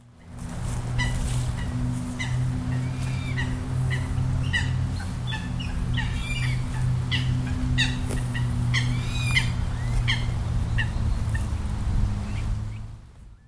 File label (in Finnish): nokikana kutsu; linnut